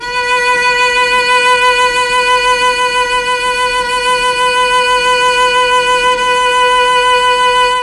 B3 CELLO, mellotron; CHMBLN CELLO

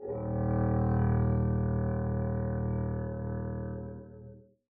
arco eb1 mf down, double bass

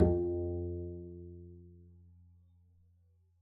pizz f2, double bass

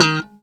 eb4 staccato, glowly bass

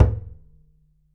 perc, pizz; meatbass

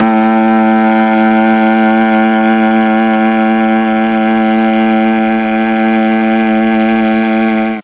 A1 viola, mellotron

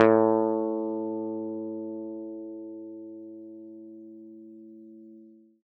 a3 open fff, swagbass

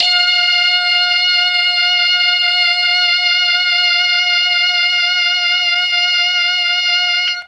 F4 brass, mellotron; MKII BRASS

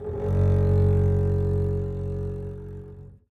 gb1 down, bass arco; meatbass

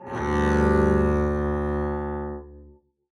arco d2 f down, double bass

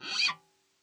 scrape, glowly bass

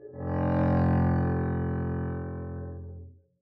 arco f1 mf down, double bass

